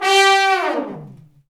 BRS, Brass Sections Fat Falls; Roland LCDP06

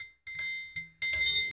LOOPS

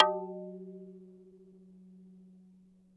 F#2, Yamaha VP-1; Music Store; 90's Sample CDs; MIYABI

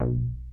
BASS, ARP, OLD SYNTHS; Rubber Chicken Software Sample Bank

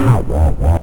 scratch, Vinyl Scratches

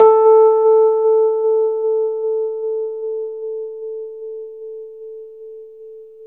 RHSM A, Rhodes; 3D Sound; 3D Keys VOL-1

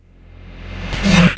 FLASH ATACK; AKAI S6000 CD-ROM - Volume 1; CYB POLIS

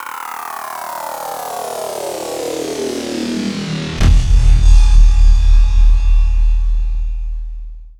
Snare Roll, Trance

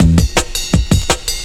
165, drum loops, drum & bass; Total Drum&Bass

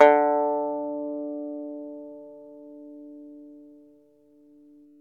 ARCHTOP, Arch Top Banjo

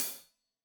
Hat Loose, Hi-Hat, Cymbals Stereo

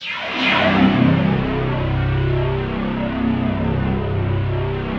fear bass; Zero G; Malice In Wonderland

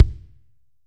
KICK; Kick 1

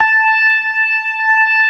TARK, organ combos

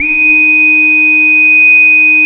BEGIN LOOP, Synth and Organ

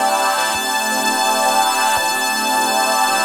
Back Pad; Pads; M8

